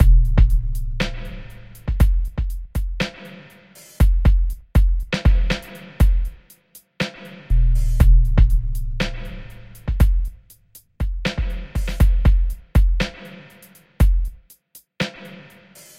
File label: beats; Toki Tori 2+; Toki Tori Collection